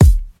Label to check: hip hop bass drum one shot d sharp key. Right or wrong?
Right